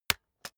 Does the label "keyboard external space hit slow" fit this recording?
Yes